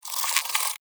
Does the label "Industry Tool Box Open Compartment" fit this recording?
No